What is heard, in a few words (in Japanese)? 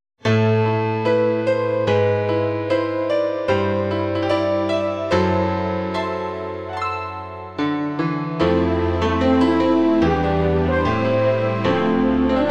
fue